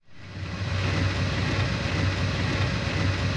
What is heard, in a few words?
Aircrafts Engines Jumo213A Starter Spin; DCS